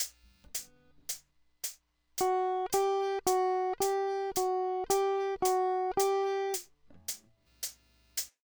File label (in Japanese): ハンマリング